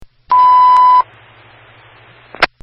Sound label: Sms Dispatch Tone